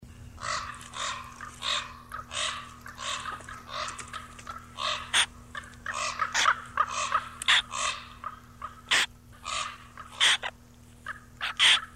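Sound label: Corvus corax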